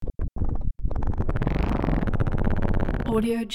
insect copter fly bys staggered movement

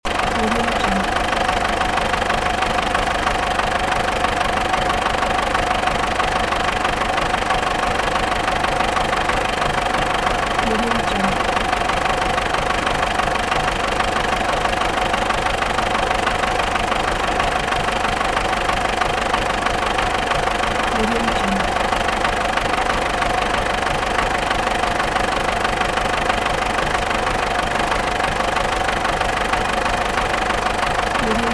tractor engine loop

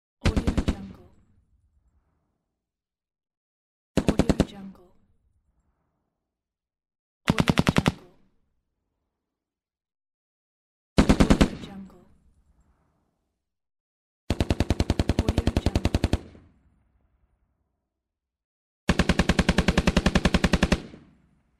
machine gun

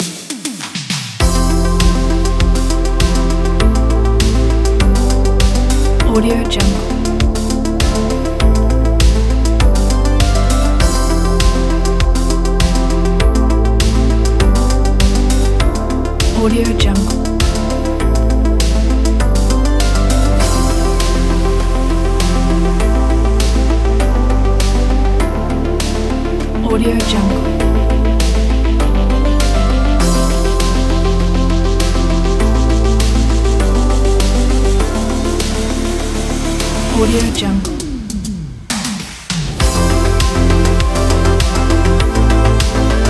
80s synthwave retrowave

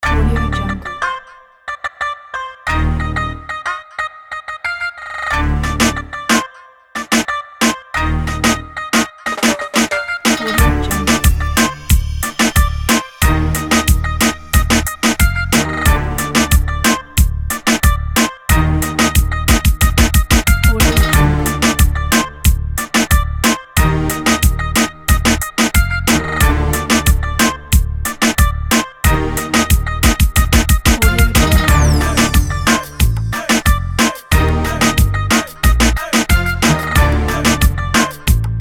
reggaeton

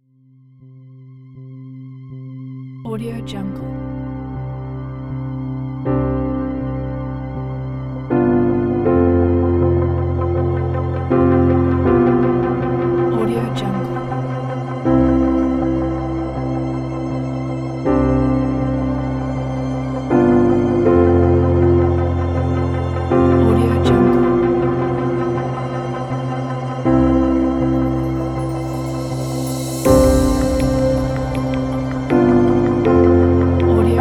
ambient soundscape piano

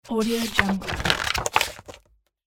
paper magazine page flip

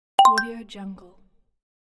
game bonus fx

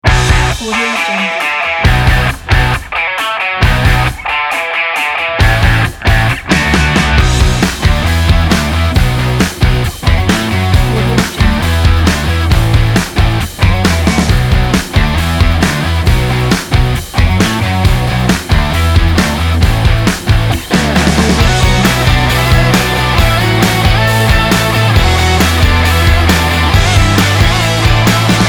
positive inspiring energetic rock

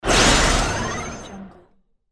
power level up

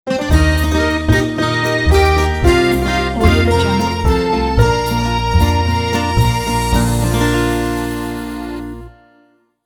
a taste of italy logo; gentlejammers music

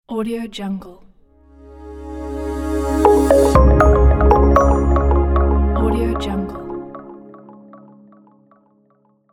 summer logo

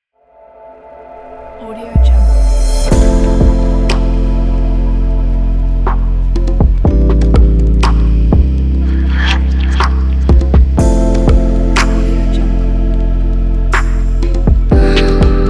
sexy hiphop erotic lounge

